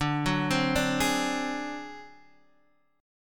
D 7th Flat 5th D down Guitar Standard; D7b5 arpeggiated; JGuitar

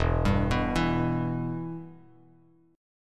E-Minor, Bass Standard; arpeggiated; JGuitar

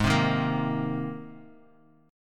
Ab Major Add 9th Ab; Abadd9 strummed; JGuitar

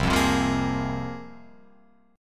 C 7th Suspended 4th Sharp 5th, Guitar, Open F; C7sus4#5 strummed; C7sus4#5 chord

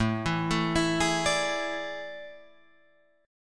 A Major 7th Suspended 4th, Guitar, Drop D

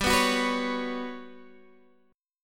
G Major 7th Flat 5th G, Guitar Standard; GM7b5 strummed

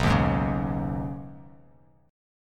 B 6th Add 9th B, chord; strummed

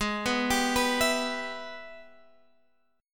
Minor Major 7th Sharp 5th; G#mM7#5; arpeggiated; x,11,9,12,12,12

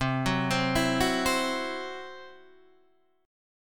C Major Flat 5th, C, chord

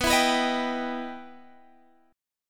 E Minor Major 7th B

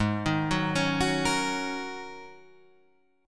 Gsharp 9th Suspended 4th Gsharp Guitar Standard; G#9sus4 arpeggiated; 4,6,4,6,7,6; JGuitar

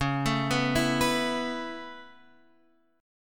C sharp Minor 7th Sharp 5th C sharp Guitar Open F; C#m7#5; arpeggiated; JGuitar

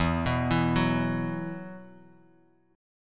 E Suspended 2nd E down, Esus2 chord; arpeggiated

